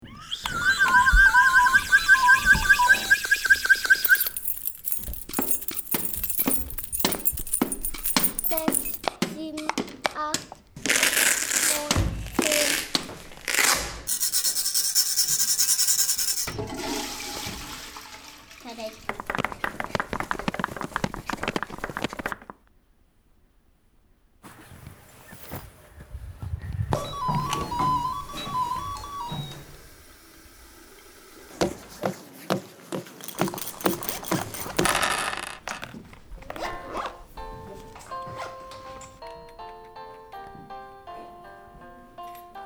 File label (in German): Geräusche aus dem Klassenzimmer